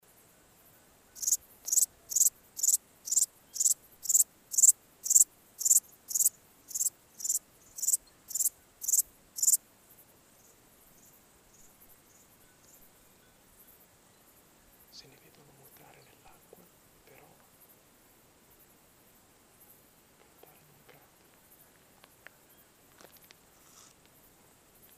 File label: Pholidoptera aptera; BIOAC ORTH